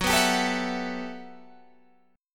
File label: G Major 13th